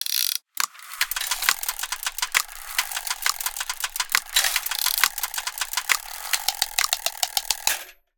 GEARBOX - DESIGNED - SMALL - Chronometric Revolving Instrument; Rock The Speakerbox